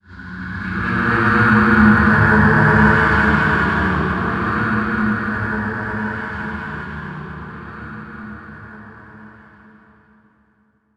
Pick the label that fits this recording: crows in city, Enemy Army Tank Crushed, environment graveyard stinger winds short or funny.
environment graveyard stinger winds short